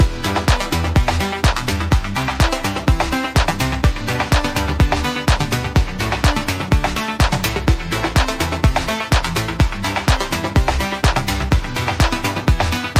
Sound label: Vaporwave